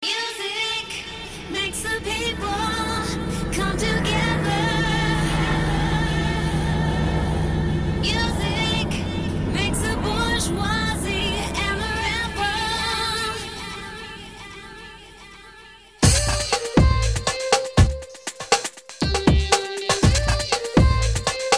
noise pollution music

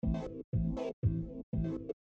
chord groove

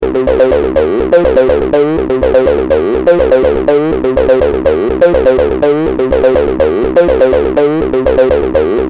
123bpm acid bass; nukearts

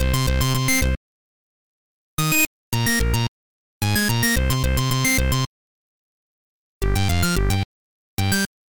synth

